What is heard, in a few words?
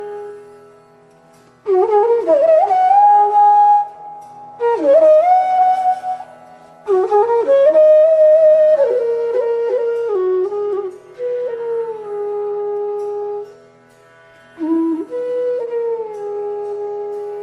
Yaman, hindustani raag